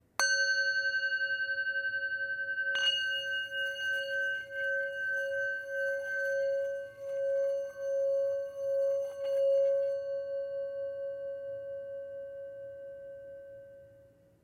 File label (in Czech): tibetska misa